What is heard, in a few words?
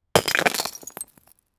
sound of breaking voxel